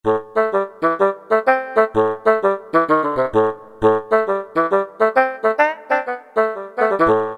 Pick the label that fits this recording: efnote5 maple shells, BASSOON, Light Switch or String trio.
BASSOON